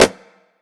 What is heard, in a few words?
subtle reverb hand clap sample g key; snare drum alternatives